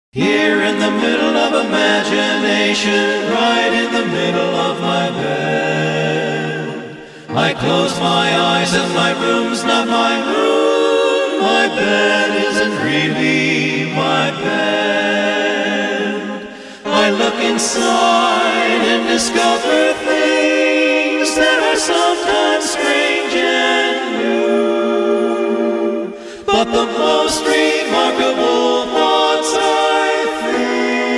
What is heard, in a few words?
Medley